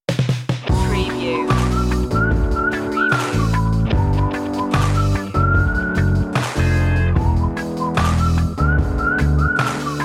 whistle lead

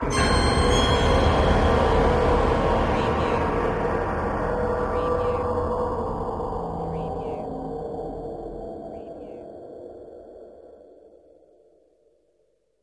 Scare Piano and Metal Stinger

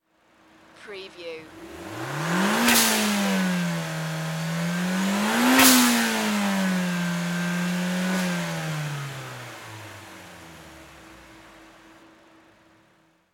NISSAN SILVIA ENGINE DOUBLE REV

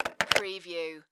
Telephone Cordless; Sounds of ringing telephones, cell phones, mobiles ringing.

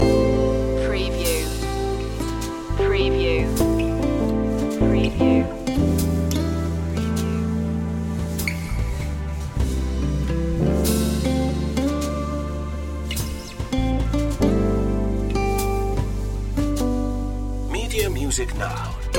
a simple love song loop

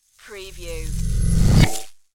Magnet Attract; sound-effects-bible

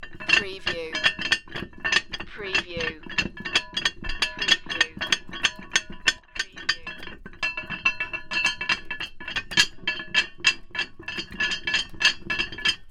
Heavy Chain Rattling On Winch Long

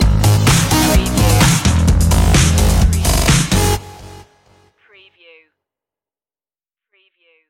robo-disco stinger

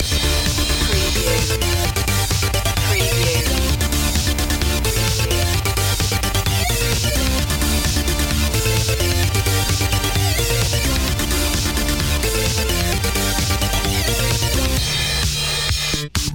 fast beat gamer long looping

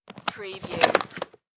Briefcase Open; sound-effects-bible

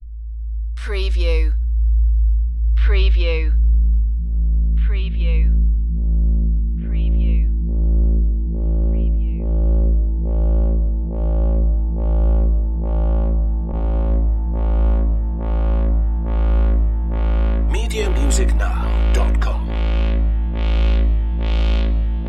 filter synth

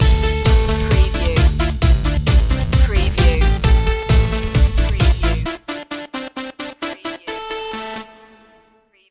retro video game stinger

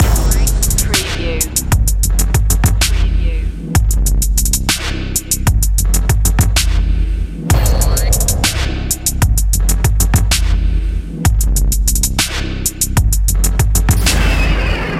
dubstep industries loop

